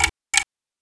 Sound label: clock